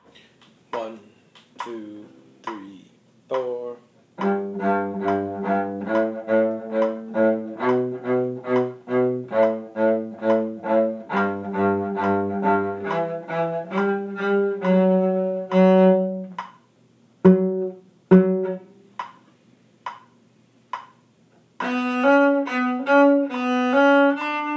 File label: cello